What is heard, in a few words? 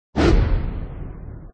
square stomp; Quicksilver